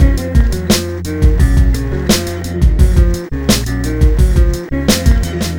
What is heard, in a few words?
klinklang; hiphop music